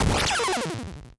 confuse

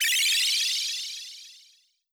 heal low

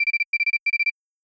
ringtone beep loop

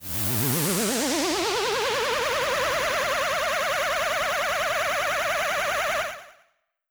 charge like megaman

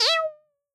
cat meow synth

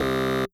incorrect answer buzzer

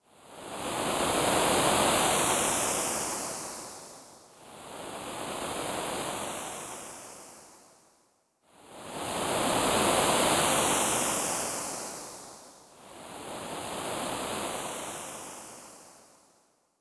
breaking wave lowbit loop

